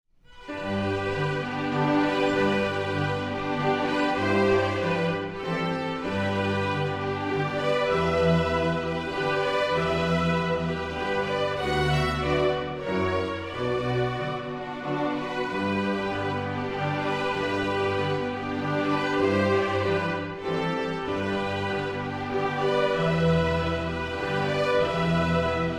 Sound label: Waltz; Brahms